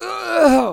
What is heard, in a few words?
Playerlose; SFX Battle; Hilzartov; Fairy Size Doesn't Matter